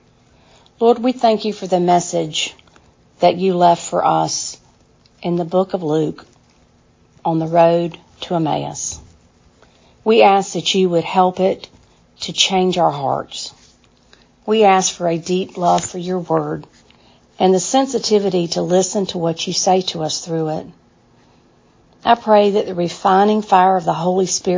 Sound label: Benediction and Closing Chorus